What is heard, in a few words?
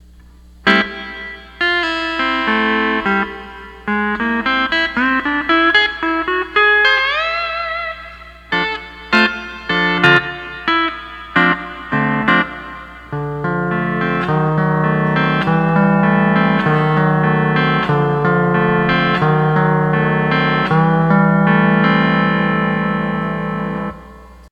12 String Lap